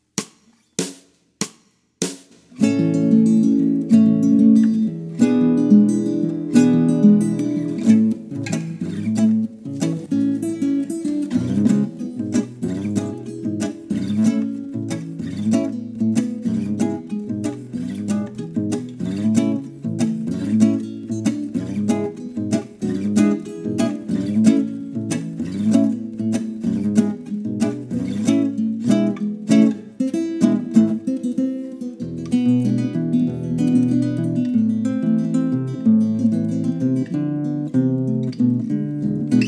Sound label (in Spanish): 2 guitarras con clik, mezcla primera guita bajo volumen